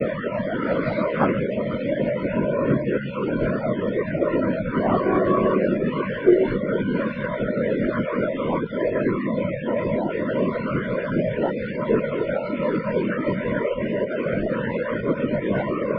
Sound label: recording while asleep; using an induction loop receiver and not a microphone